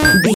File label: retro collect pickup item